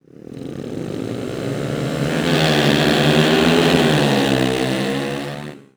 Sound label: Start, UI